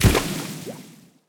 Water Tank Footstep; AudioWizards

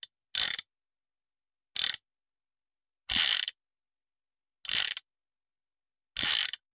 Hand Gun Foley; Sound Ideas; Guns

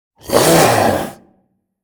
Zomby Stressed; Blind Survivor

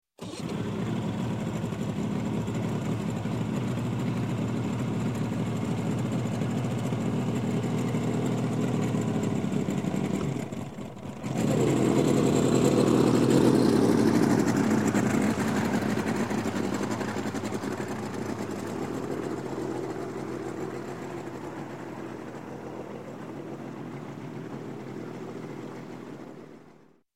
Boat Outboard, 150 Hp - Start, Drive Away From; Dock; Sound Ideas; Series 2000

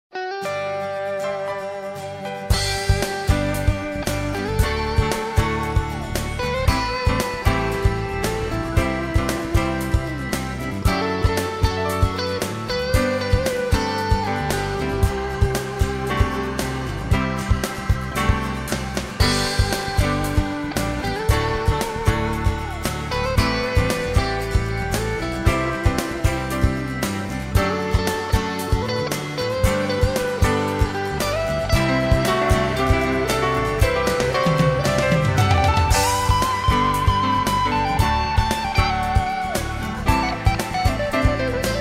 ROCK EZ, Royalty Free Music; podcast production toolkit

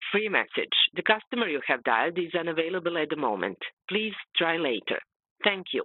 phone error; devices accessories utilities; NS Studios - ultra sound collection

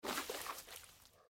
Mud; Blind Quest - The Frost Demon

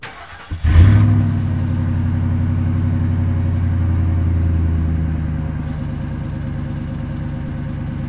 Truck Expedition Start Loop; Trucks

